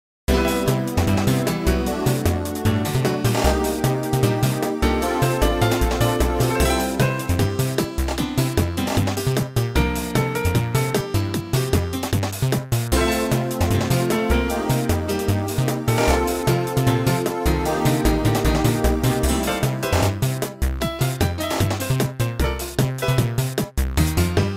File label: Single Player Menu Music; MKDS